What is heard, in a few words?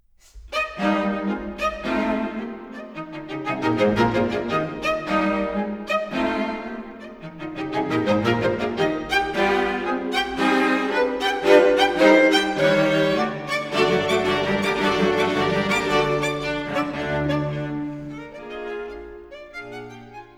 String Quartet; Schubert; String Quartet no10